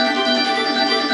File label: ui mojo loop shiny shortcut